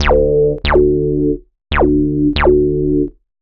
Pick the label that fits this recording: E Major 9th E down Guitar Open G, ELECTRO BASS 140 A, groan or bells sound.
ELECTRO BASS 140 A